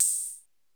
Electro 78 OHH; Central Processing Unit; Electro Samples Vol. 2

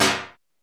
Electro Samples TRASH; Central Processing Unit; Electro Samples Vol. 2

